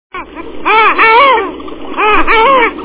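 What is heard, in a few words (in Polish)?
lodówka, głosy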